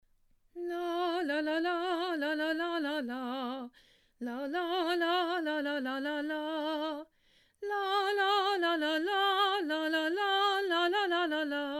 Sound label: hallel; The basic psalm mode for HALLEL; THE FESTIVAL SERVICEs